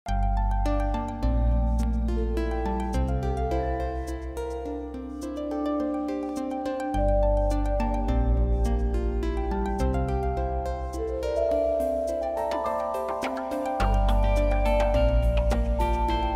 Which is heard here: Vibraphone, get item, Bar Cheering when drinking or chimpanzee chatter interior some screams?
Vibraphone